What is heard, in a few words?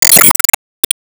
Woodblock Minion Active Hit; gamerip